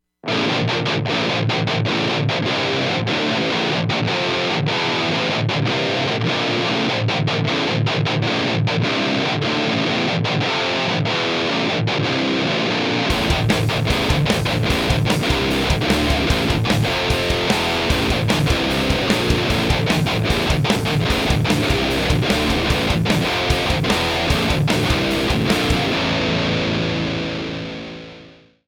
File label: 7 String Trident II A5